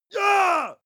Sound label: male attack shout; schreie; menschen